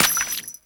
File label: Steps Water, Footsteps; Warframe; Grineer; Royal Jester; Foley